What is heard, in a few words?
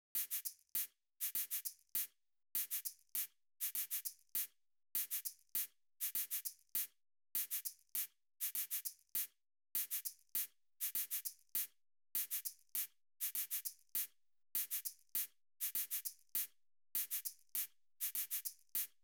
shake